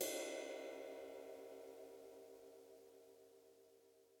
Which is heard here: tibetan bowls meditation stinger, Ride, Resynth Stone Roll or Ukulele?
Ride